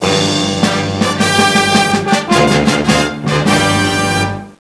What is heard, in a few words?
FANFARE